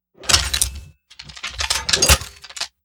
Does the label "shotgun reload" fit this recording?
Yes